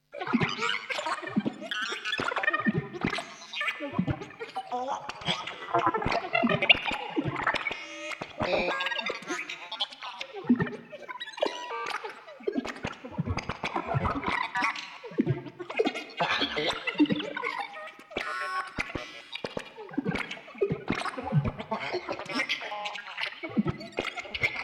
intergalactic communication science fiction sounds; sci-fi; Si-Fi